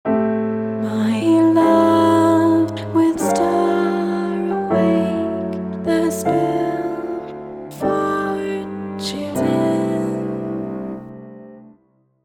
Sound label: harmonize cliche